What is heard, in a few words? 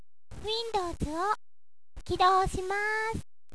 win